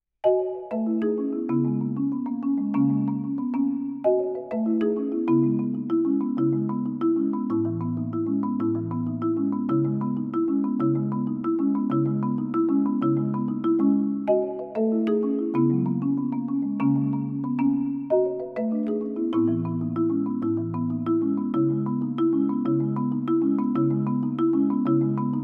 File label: marimba